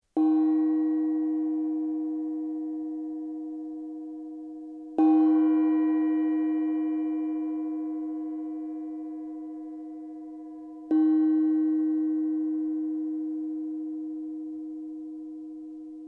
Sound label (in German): tischgong